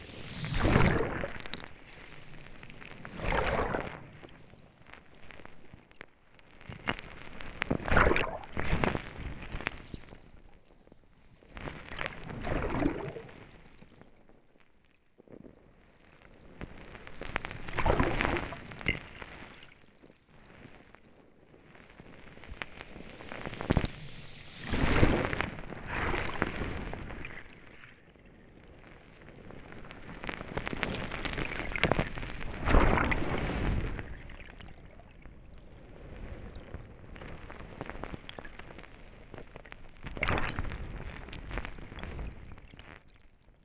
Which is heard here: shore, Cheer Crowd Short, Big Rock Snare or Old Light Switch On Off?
shore